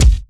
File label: rich top end kick single hit a sharp key; kick drum samples